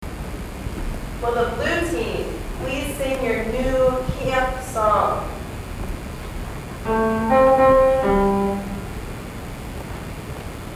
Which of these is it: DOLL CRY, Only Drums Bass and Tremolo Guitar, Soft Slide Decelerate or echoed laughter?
echoed laughter